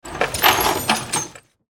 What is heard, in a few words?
Rummaging through toolbox; hand tool